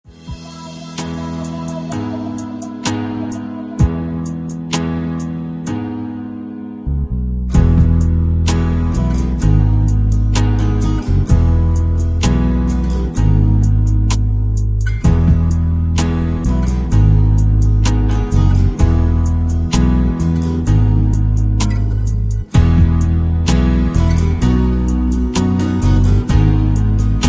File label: Instrumental